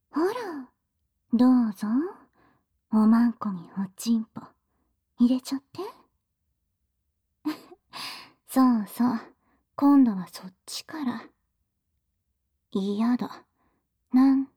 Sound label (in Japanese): 耳舐め, ASMR; ヴァンプの大しゅきホールド…ドラキュリアの妖艶耳舐め; サキュバス